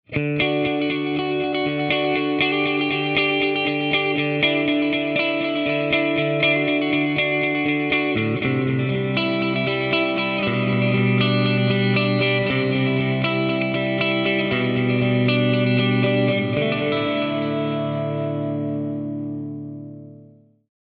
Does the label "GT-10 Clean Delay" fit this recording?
Yes